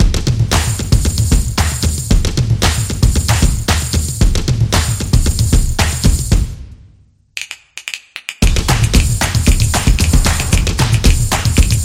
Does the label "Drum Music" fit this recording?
Yes